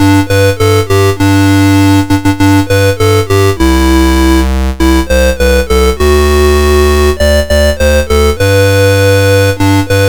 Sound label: Jingle Bells, MOS6581R4